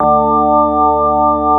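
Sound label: CX3 organ; USB Soundscan vol.09; AKAI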